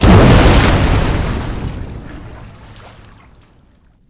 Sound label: water explosion; boss2intro